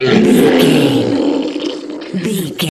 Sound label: troll monster hurt pain long